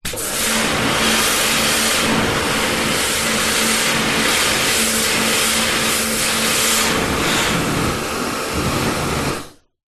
industrial pressure washer k2 spray garage outside metal wall from insid; kartcher; inside; zapsplat